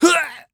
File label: VO Death; VIP